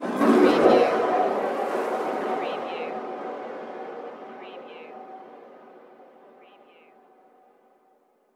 monster machine passing

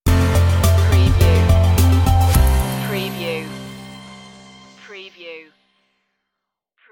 floating stinger

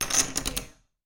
Poker Chips Drop Stack Of Chips On Wood Table

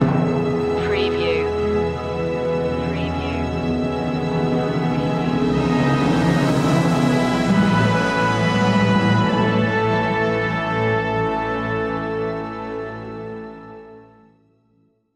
olympic glory intro fanfare

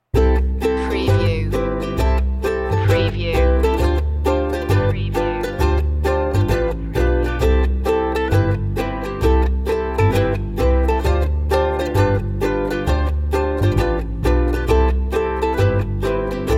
the elevator under the sea underscore no drums

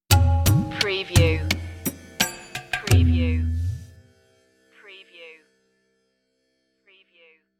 indian lullaby stinger

